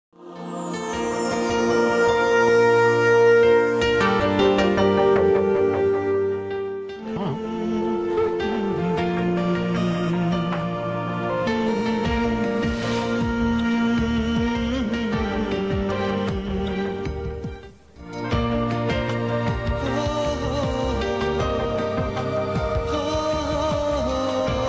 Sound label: instrumental